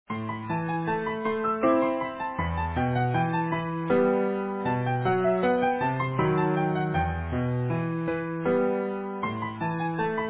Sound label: Easy Piano